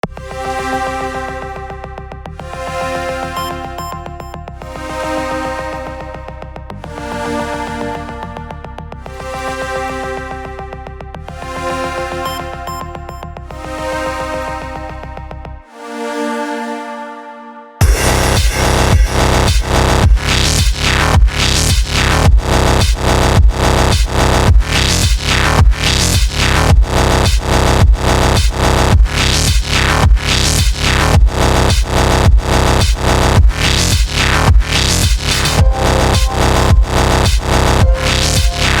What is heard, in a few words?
very WIP hard synthwave thing